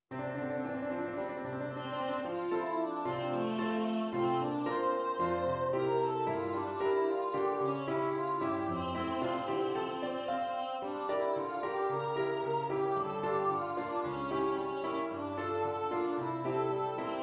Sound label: PIANO; Arthyr the Boy Who Would Be King a Musical